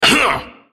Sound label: effort; BloodKingVladimir